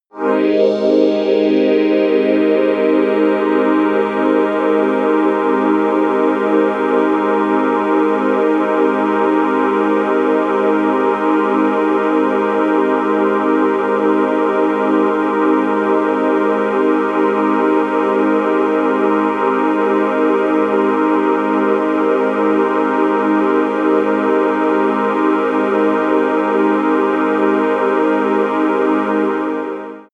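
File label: morning air, pads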